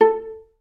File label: Vla Piz A3; Digital Sound Factory Virtuoso